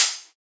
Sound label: Open Hihat; Penelope; Cymatics; Drum One Shots